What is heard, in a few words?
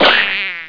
swarm Sound; Ferazel's Wand Demo